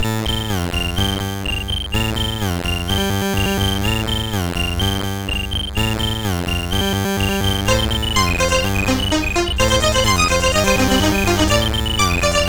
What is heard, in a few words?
Mix, CSG8580R5